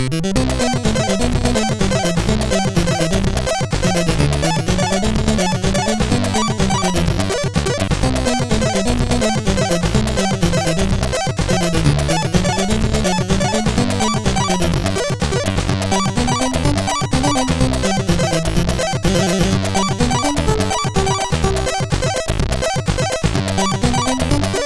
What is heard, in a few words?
sid CSG8580R5